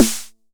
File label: 909 SD; Goldbaby Tape808 & Tape909; The Tape 909